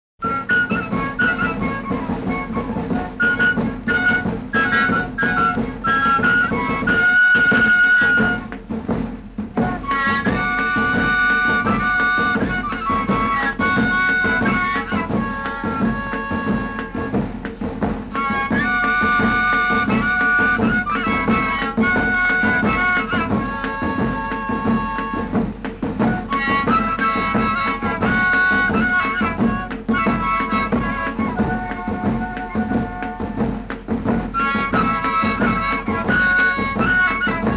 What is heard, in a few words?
Panpipes And Drum Song; Voyager - Murmurs Of Earth - Voyager Golden Record; Casa de la Cultura, Lima (Peru)